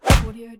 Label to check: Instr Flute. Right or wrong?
Wrong